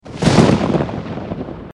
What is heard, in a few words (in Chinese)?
雷声; 武器音效